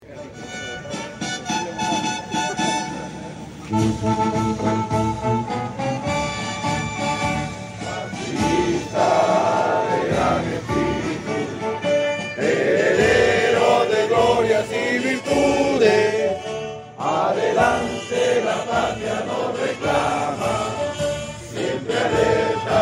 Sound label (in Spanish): himno castillista colegio militar; ramon castilla